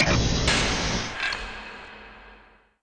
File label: trigger; kyper kuutio